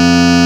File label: TRI WEAK+FM, SYNC+FM PADS; Zero-G Ambient 1